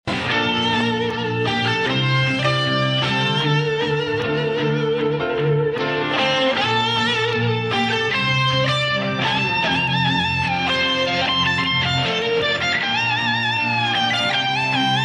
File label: Vast sea and sky (guitar)